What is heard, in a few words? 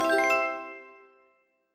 correct